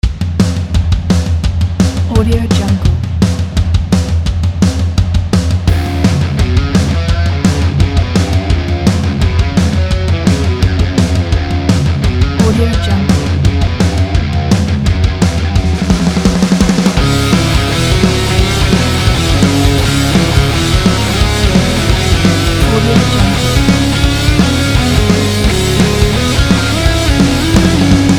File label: rock bar